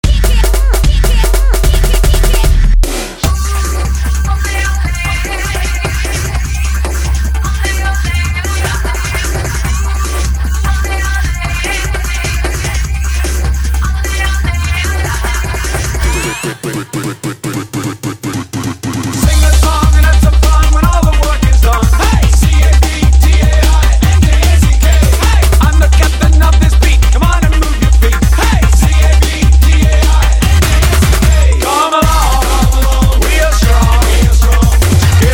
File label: Solo Bass Mix; Dance Dance Revolution; DDR